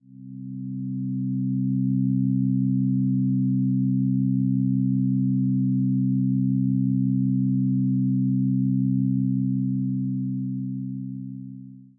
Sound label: quiet chord